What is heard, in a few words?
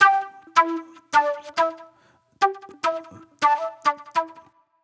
Agro Fusion Flute